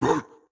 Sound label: Chop Wind Up; Caffiend Caelan; Going Under